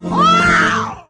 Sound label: ow male SUNG, voice; ooh ahh; ow ouch